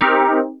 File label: dx7 Synths